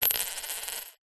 money australian 5 cent coin drop on tiled table and spin; foley